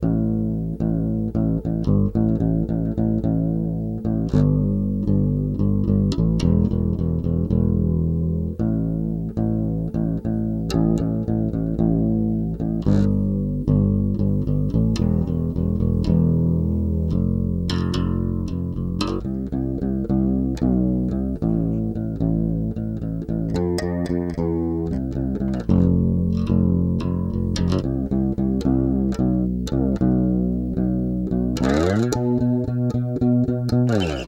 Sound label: bass original